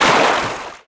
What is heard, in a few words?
waters; lander